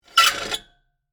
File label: Table Slide Back; Foley; Backward, Metal, Movement; Slides; BlastWave